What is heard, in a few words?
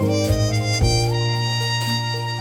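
Trumpet; Weird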